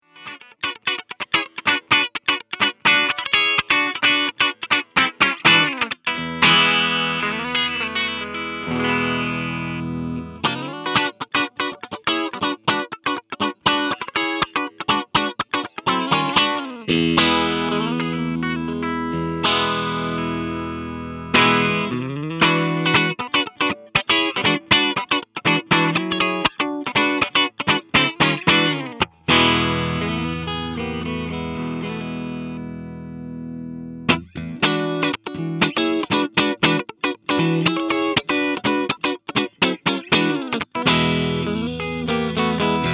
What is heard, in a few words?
clean; STK-S4 Classic Stack Plus